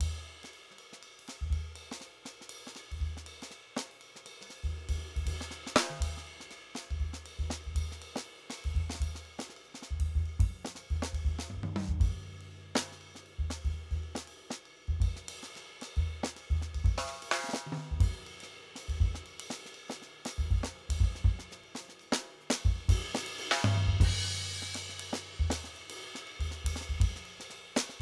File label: EZDrummer Multiout Example